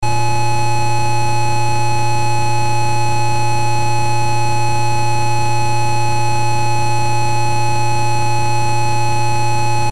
Horn With Engine On; crazybus; genesis

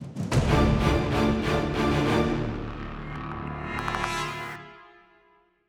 190bpm; Legends of Pegasus; windows gamerip 2012

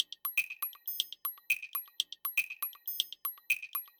bird cue; Toki Tori 2+; Toki Tori Collection

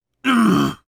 Grunt

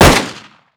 pistol fire

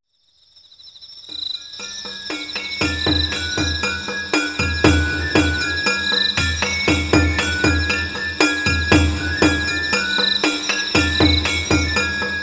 Festival Accompaniment; Persona 2 Innocent Sin

